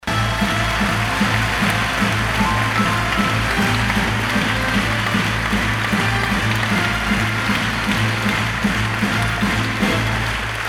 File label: circus music with claps